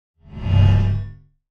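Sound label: Energy Slide Door Close; doors high tech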